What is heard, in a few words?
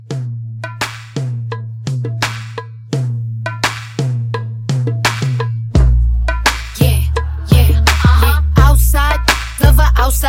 Mashup 85